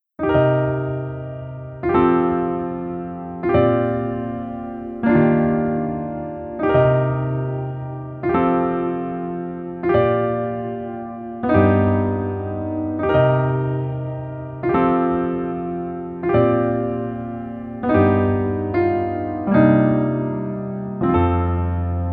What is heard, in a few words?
Piano ver